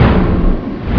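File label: ambience fan whoosh; dm criticality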